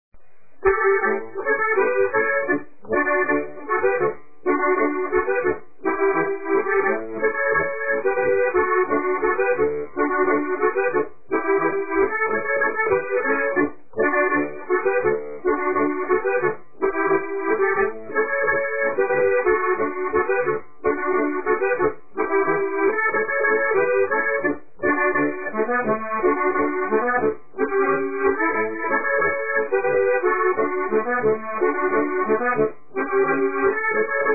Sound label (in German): bayrisch polka; allgemeines volkstanzen